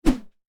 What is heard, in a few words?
Fast Whoosh Bamboo Swoosh Through Air